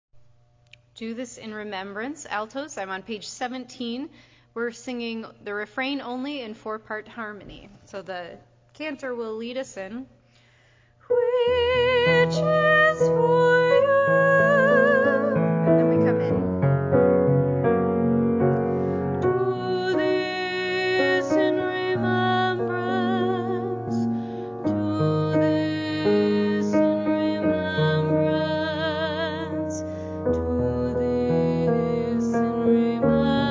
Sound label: Do This in Remembrance alto